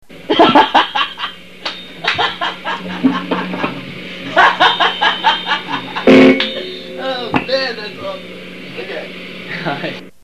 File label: laugh